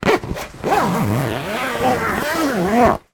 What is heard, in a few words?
foley bag holdal polyester zipper; zapsplat